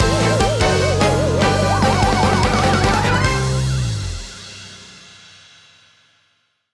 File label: Win Jingle; Event Battle - Three Shadows